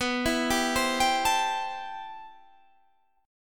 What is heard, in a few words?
A-Minor 7th B; Am7/B; arpeggiated